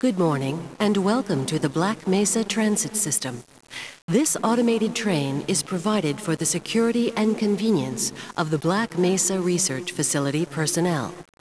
train

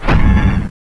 stomp; endboss

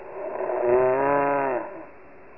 zombie barn idle

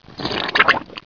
flesh

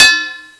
machete metal

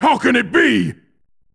death; bardock

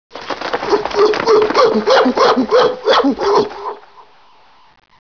animal runs

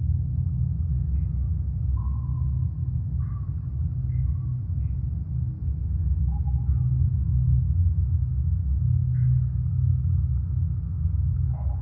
scape bunker

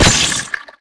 fire, hornetgun

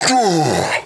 pain, armorman

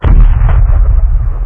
explode dist; Explosions

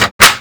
clip in, weapons MP5

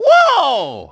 barnacle hit; mario; supermariobros

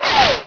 whizz

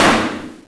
blunt hit

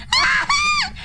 koro scream; korone

